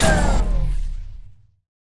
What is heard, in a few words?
Speed Pad Slow; Subway Surfers City; Android, iOS